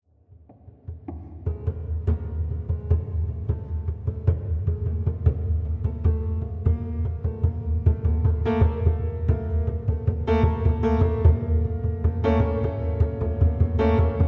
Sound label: prepared piano; Improvisation Part I.; ビンダー教授のピアノ・インプロビゼーション